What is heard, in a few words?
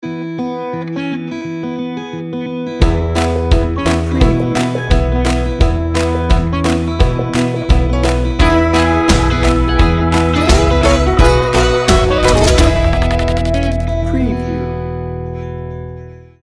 folk electric